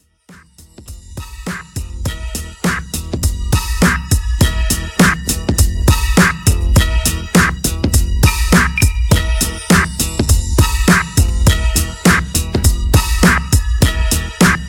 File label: Redrum 102Bpm Dirty